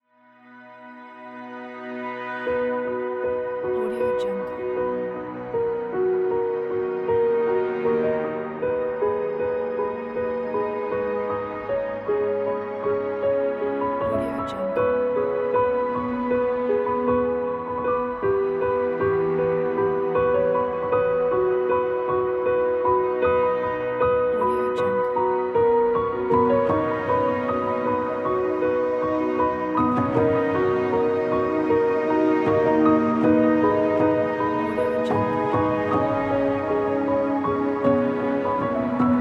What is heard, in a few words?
beautiful nature inspiration